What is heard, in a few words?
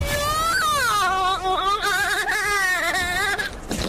kid crying; over fortnite